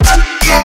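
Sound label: sms short sound for sms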